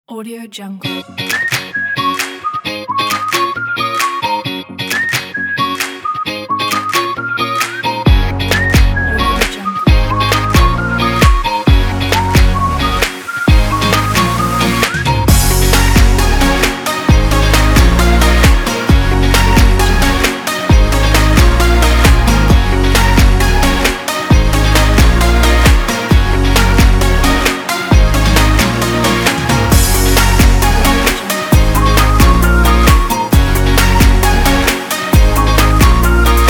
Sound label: happy